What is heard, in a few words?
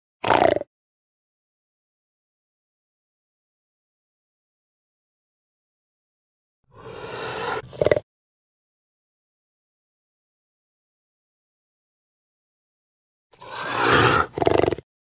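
puma golos, puma voice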